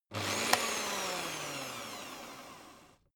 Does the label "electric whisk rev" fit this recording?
Yes